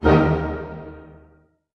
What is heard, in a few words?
D# MAJ PP, orchestra hits; Sound On Sound - Peter Siedleczek's Orchestra